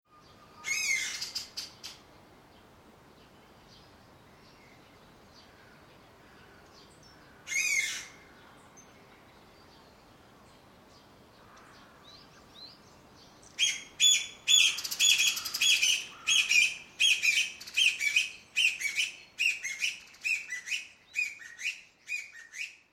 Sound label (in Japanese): kojukei tampopo